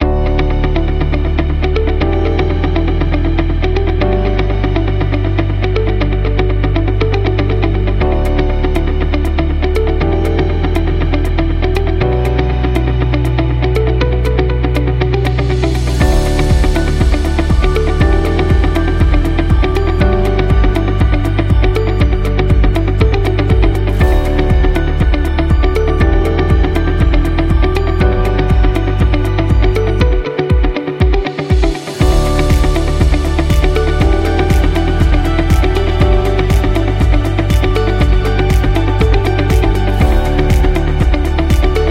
Chill Corporate, Corporate and festive background music for Chinese New Year